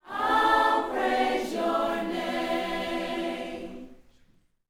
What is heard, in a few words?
Vocal Gospel PHRASE